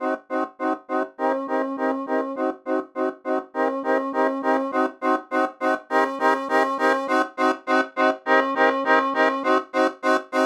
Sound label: chord thing